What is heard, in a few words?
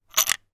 gun mosin nagant; still north media